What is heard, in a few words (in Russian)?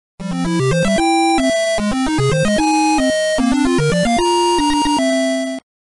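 zvuk pobedy, victory sound; super mario bros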